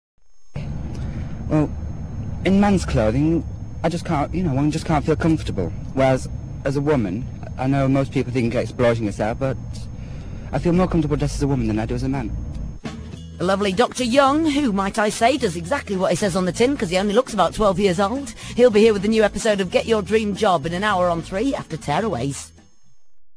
jingle; bbc

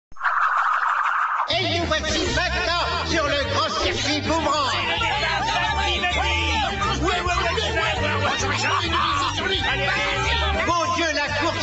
jingle; boomerang; course

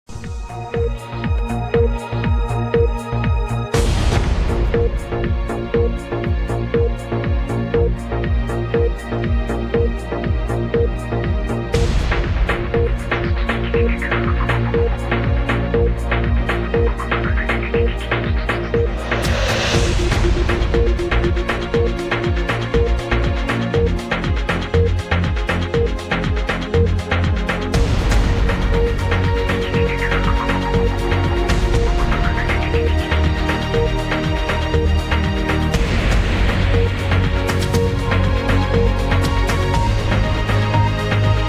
news countdown; bbc

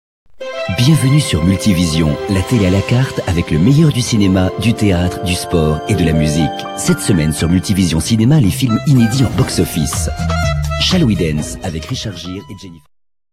jingle; multivision

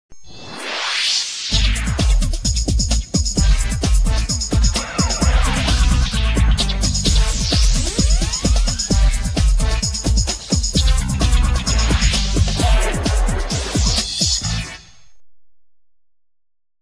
jingle serie

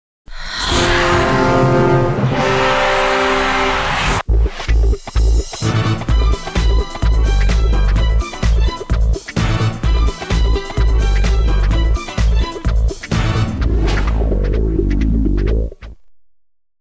jingle top 20 goal; eurosport

